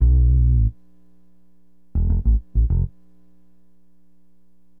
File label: BASS, Acid Jazz Funk